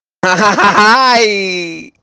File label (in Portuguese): risada louco; hamtaro